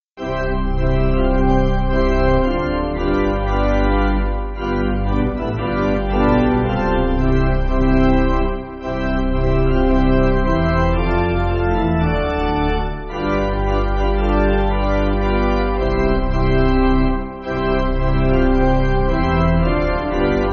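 Pipe, Music Backing Files for Churches - Pipe Organ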